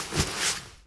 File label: perform cpr